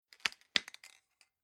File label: Spray Can Cap Closed Two Parts Metal Rattle Empty; Tom Hutchings